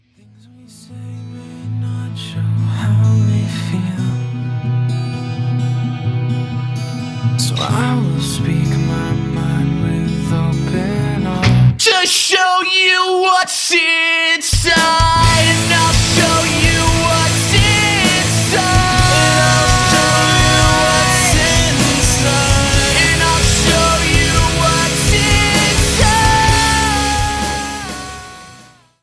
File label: pop song; hip hop